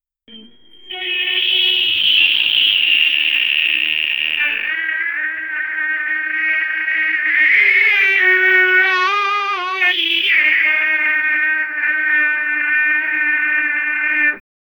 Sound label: mad yellow noise